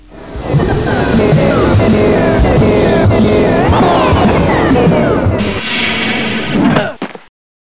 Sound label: gate off